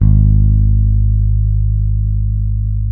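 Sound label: 6-string fretless bass; Spectrasonics; Bass Legends; 6-STR FRETLS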